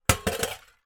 household safe small desktop metal drop on floor

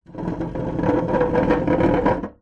chair dining heavy metal scrape drag wood floor

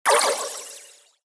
fantasy Spell

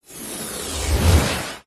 wood pole end scrape drag on carpet

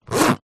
pouch small zipper zip; zapsplat; foley

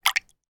small amount of water in drinking glass movement

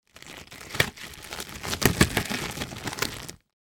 sport swimming cap latex put on; sound effects one

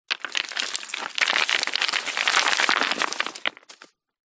foley stones rocks small landslide fall down

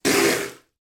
human spit bath water from mouth

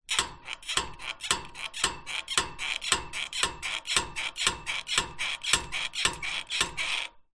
metal pole bendy squeak bang repetative; foley

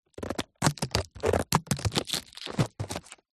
foley rubber thin elastic stretch stress; zapsplat

